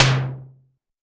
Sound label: Simmons, Clavia DDrum Pitched Down; Clavia DDrum-AT